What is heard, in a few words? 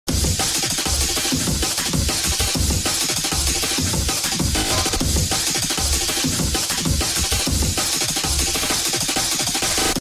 horror; fun bot sprunki pyramixed